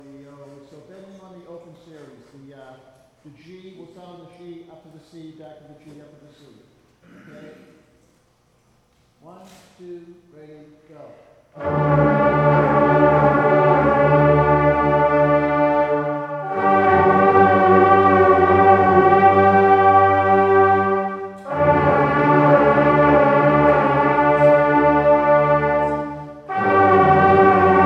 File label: Bending Notes, brass; woburn ma